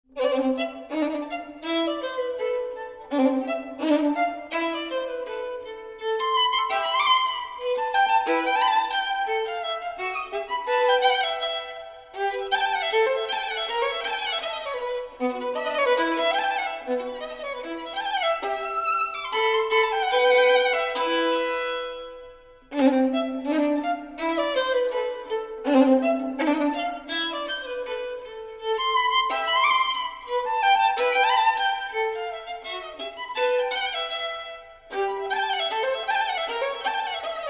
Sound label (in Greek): bioli